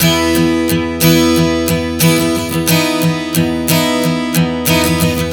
Strum 090 E, Acoustic Guitar, One Chord Strumming 090 bpm; Songwriter Acoustic Guitar Companion